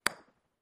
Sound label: Golf Putt